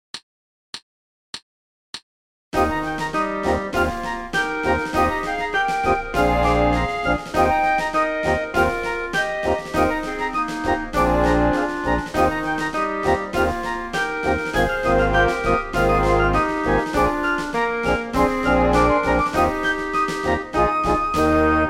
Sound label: 100 bpm